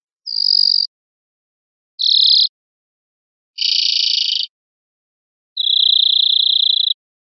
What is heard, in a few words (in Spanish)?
grilleras